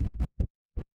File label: transition glitch